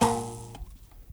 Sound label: MOBO HI, MOUTHBOW, Heart of Africa